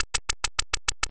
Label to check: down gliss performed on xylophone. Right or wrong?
Wrong